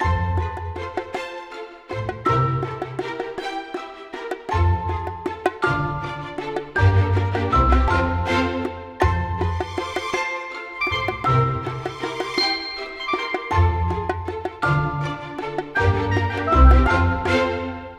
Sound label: gift loop; netent